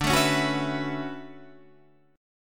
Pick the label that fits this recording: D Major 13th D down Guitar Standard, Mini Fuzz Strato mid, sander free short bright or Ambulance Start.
D Major 13th D down Guitar Standard